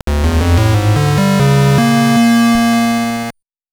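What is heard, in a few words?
Start, start sound effect; Dracula Densetsu II; GB; スタート効果音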